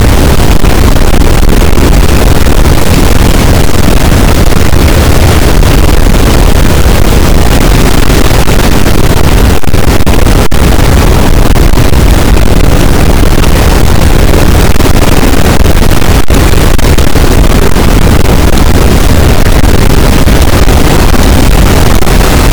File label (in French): cachalot positif, boat, Physeter Macrocephalus